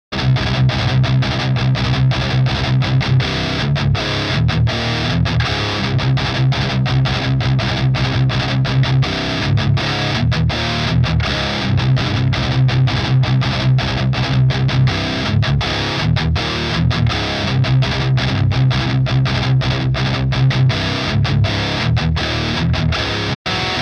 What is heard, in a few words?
12ax7 cabsim